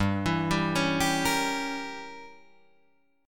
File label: G Diminished 7th, Guitar, Drop D